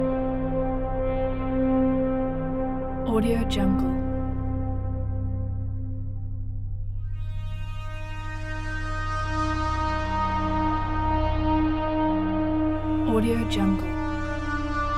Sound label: airy synth loop roomtone layer; esmteam